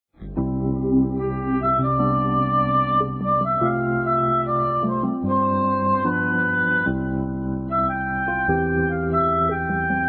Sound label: bgm